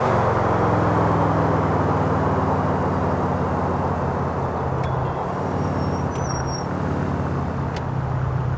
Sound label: throttle off fast speed, vehicles; premier